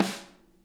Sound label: SNARE, Interactive Drum Kits; Sonic Reality